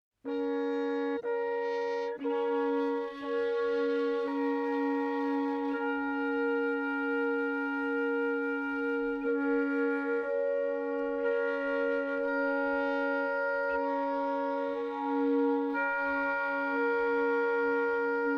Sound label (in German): Akkordpuls